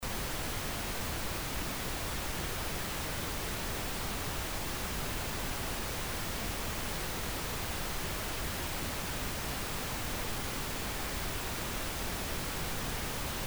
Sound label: Pink Noise